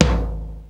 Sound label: RX5 TOM; Hollywood Edge; The Big Bad Beatmachine